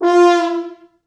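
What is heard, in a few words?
French Horns FX MOD; 4 FrHorns; East West; Quantum Leap Horns Sax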